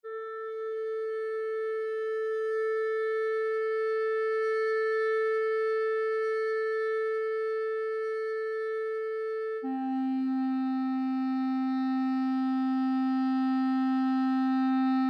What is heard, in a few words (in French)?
clarinette